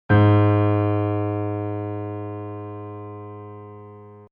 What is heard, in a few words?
Note A Flat